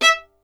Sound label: STR VLN, Solo Instruments; Miroslav Vitous